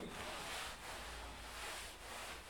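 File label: Scrubbing Carpet With Brush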